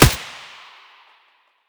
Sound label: svu muzzle mono distant, weapons